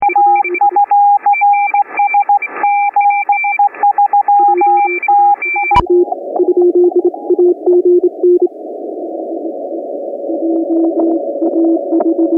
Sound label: cw